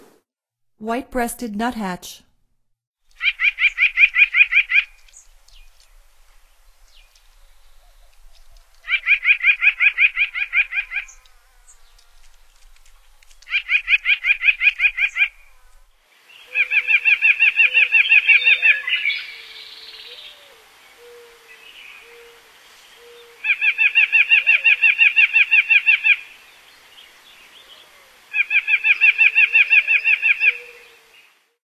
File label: White-breasted Nuthatch, Songs of Birds of North America; Stokes